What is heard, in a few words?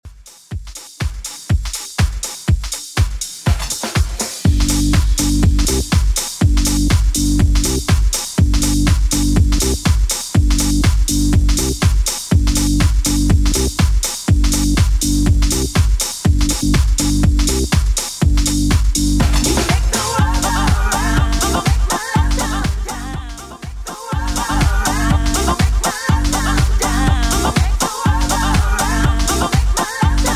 90s Redrum 122bpm